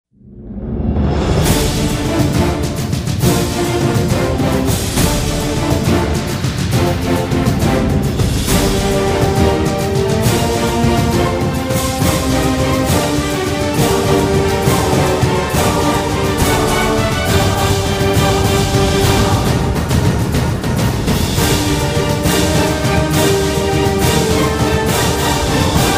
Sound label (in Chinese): 地产大气